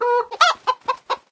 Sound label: Hen Scream